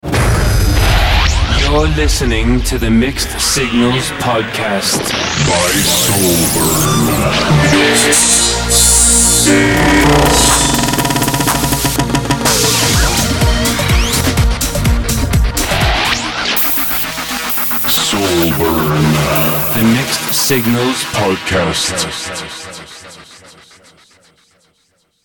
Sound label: Podcast Intro; Soulburn; Mixed Signals